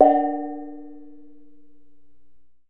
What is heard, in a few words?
D2 SOFT, Kye Waing